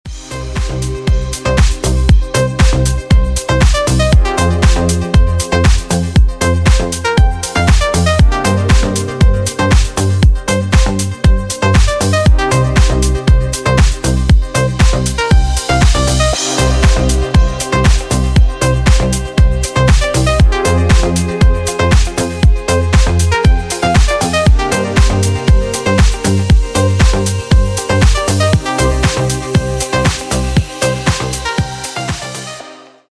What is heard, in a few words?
deep house